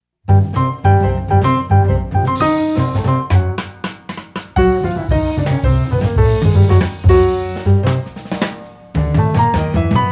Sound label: Blues for G C